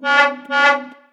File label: bass stab C; wungtwo